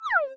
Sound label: shrink; SideSlide